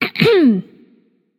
clear throat light; smite; voicepack; hel

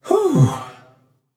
nv sigh; smite; xbalanque

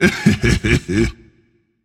hehheh; smite; hunbatz

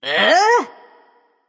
nv confused; cupid

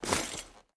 pet armor; smite; lancelot

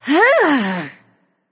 grunt lift; smite; arachne

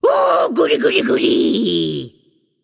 "yay"; babayaga

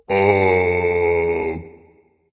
seriously dragon; fafnir

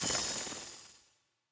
idle bats; smite; scylla

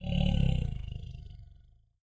grunt idle; fenrir

